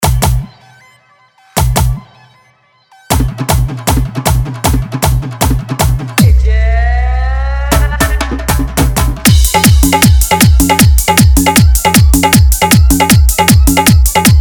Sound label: Bhojpuri Fully Dhollki Bass Remix